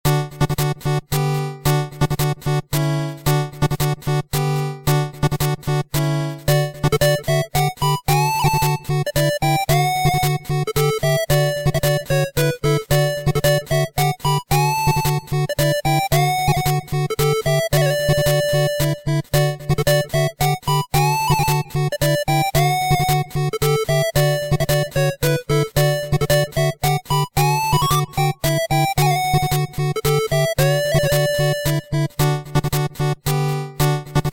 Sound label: 8-Bit; Miiverse Gallery